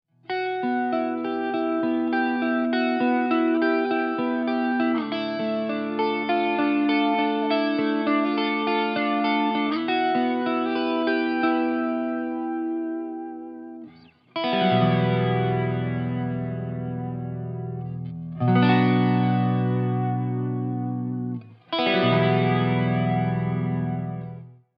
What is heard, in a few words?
clean